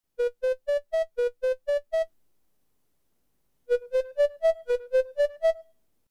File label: Chorus